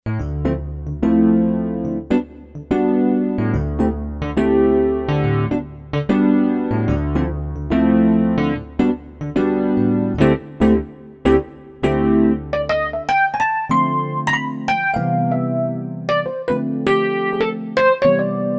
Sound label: Rhodes